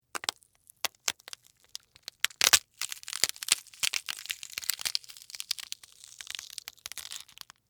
Crunch Celery Slow; Blast Wave

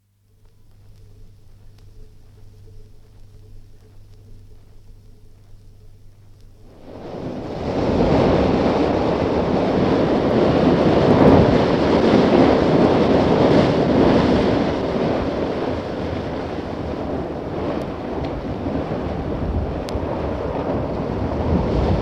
Rough Wi, Rough winds on shore; From 78 disc; Weather; BBC

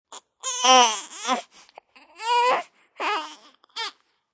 Baby Complaining, Newborn baby sound.; Baby Complaining 3; Babies

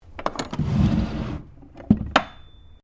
Drawer Cabinet Wooden Large Heavy Open

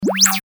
Comm Droid Noise, robot sound effect; Robot Sound Effects; science fiction sound effect

